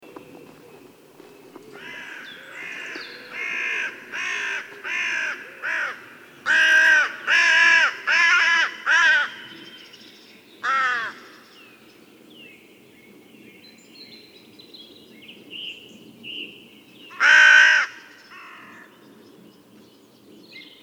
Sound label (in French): Corneille noire; corvides